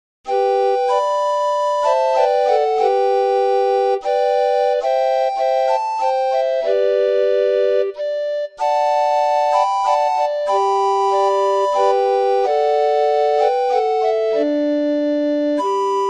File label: I need thee every hour, orgue barbarie